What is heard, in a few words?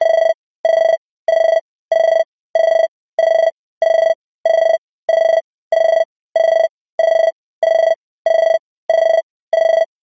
science fiction console computer alarm ring loop; zapsplat